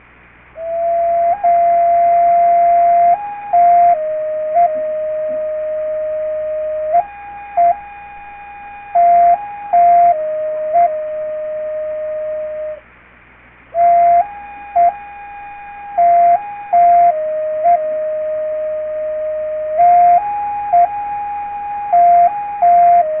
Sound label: sioux flute